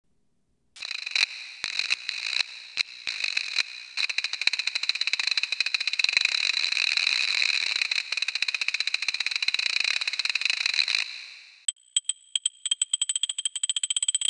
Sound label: Ratchet